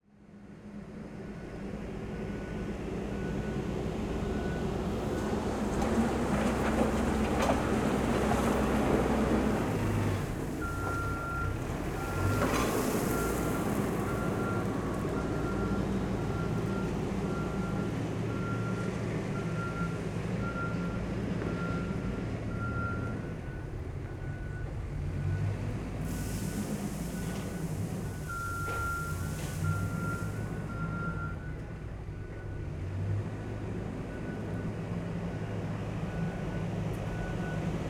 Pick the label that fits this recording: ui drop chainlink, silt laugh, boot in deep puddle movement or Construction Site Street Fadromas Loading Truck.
Construction Site Street Fadromas Loading Truck